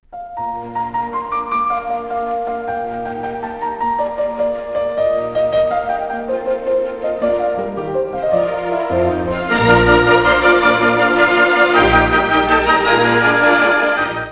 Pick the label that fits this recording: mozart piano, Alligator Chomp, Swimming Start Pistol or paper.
mozart piano